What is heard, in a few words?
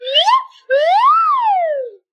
slide whistle good lookin